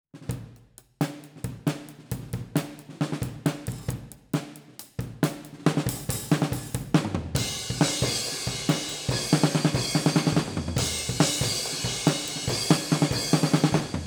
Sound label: Snare Overheads